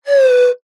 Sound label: musical pipe metal blow over top whistle tuned pitch descend